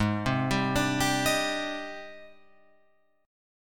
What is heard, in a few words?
G sharp Major Sharp 11th, Guitar Open F; G#M#11 arpeggiated; 8,7,7,9,10,10; JGuitar